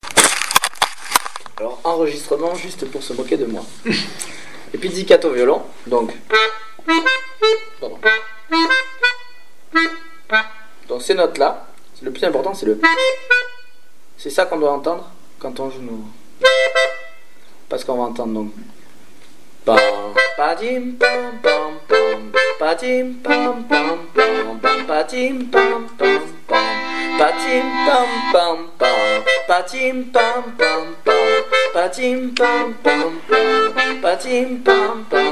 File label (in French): decomposition pour violons